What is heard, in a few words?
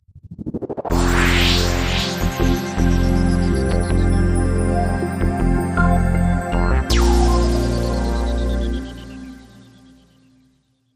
Ramp Light Sting